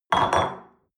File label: espresso upset table soft, restaurant cafe; sport-freizeit